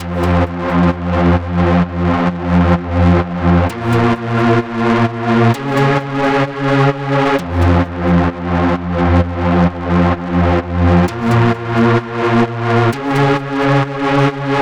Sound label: LEAD 130BPM